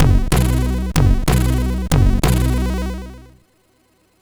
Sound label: Polonaese, sid MOS6581R4